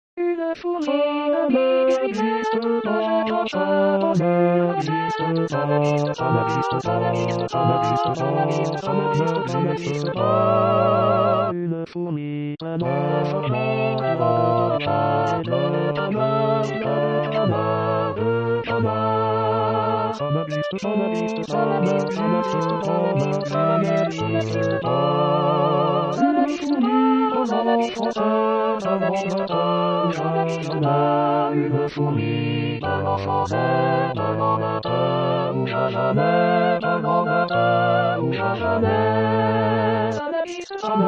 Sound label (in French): La fourmi choeur; 2voix/piano